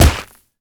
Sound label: punch grit wet impact; Infernal Radiation